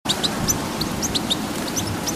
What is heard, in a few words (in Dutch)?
Putter, trekroepjes; tijdens de vogeltrek